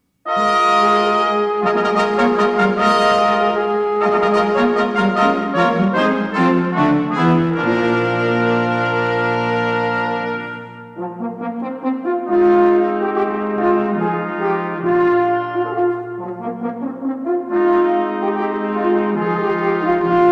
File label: Fanfare; La Peri